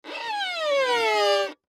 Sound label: Cartoon Violin Descend String; Cartoon Music; Cartoons; Blastwave